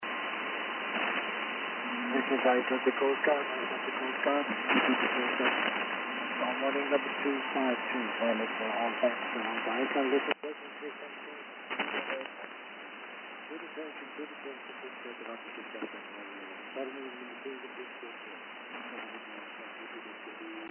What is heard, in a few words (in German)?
Grenzwelle 137m Utility USB 2182khz